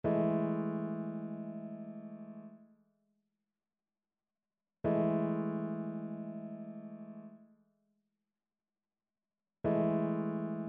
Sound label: trichord; trichord identification